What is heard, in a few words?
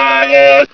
slv alert; gearbox; aslave